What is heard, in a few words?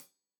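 hat closed, hi-hat cymbals stereo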